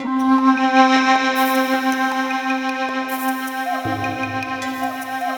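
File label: wave sequence, synthesizer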